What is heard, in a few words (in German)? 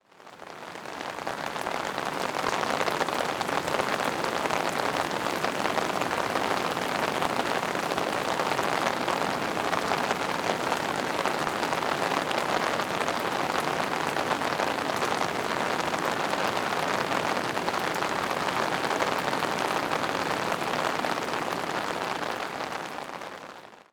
Auf Zelt, Regen; Regen im Zelt